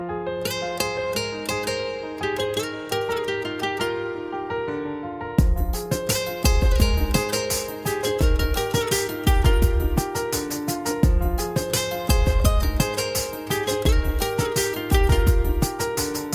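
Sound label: guitar play, ringtone; blues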